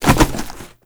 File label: Hit Ledge, Foley; Warframe; Tenno